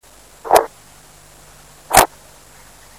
Otarda, uccelli

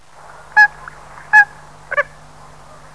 Folaga cornuta o crestata

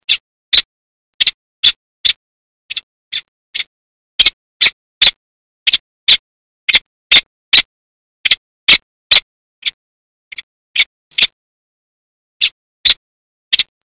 Pernice sarda, uccelli

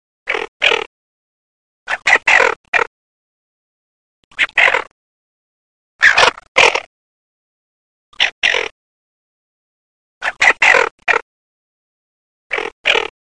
Moretta

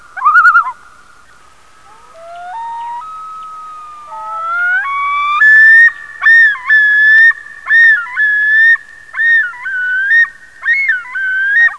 Strolaga maggiore, uccelli

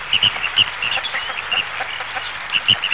Fistione turco

